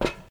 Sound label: Truck Step; Phasmophobia